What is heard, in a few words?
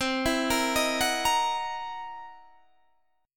C 7th Sharp 9th Flat 5th C Guitar DADGAD